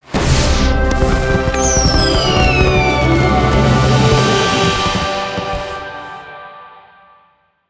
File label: Celebration; Nexus Force; aronwk collection; ndaudio